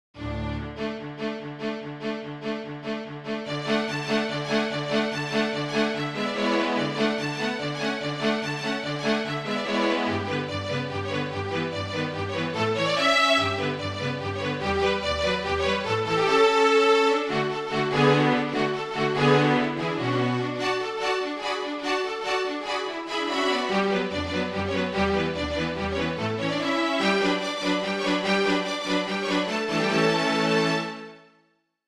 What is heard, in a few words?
Bartok Dances No5, Six Romanian Folkdances; No. 5 "Rumanische Polka"; from Six Romanian Folkdances - Bartok